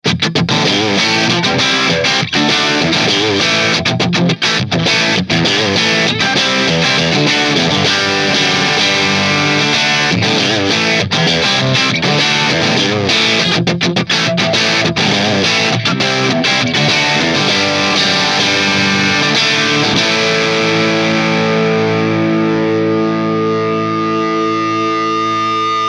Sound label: Marshall strato